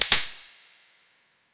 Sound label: reverbed camera flash